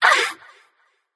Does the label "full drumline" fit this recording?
No